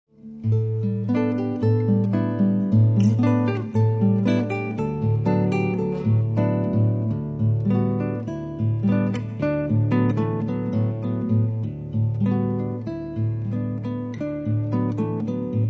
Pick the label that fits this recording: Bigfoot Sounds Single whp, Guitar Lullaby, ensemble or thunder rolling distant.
Guitar Lullaby